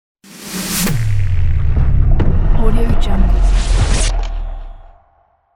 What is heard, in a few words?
alien technology